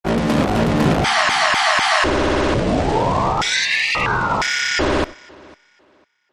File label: FX Space Gtrs